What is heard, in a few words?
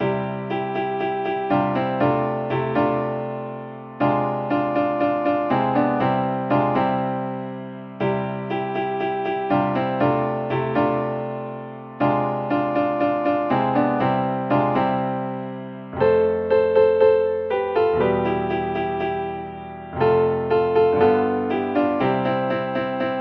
accomp